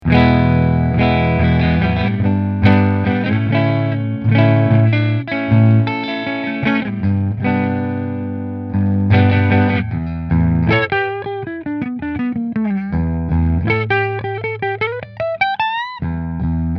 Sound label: Robin Avalon Neck Fender, guitars